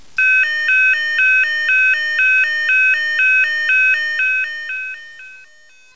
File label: Tone Alternating 510-610 Hz at 2 Hz (250ms-250ms); Squashni Micro Tones